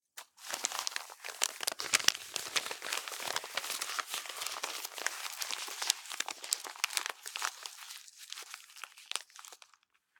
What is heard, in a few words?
papers crumple; sonniss gdc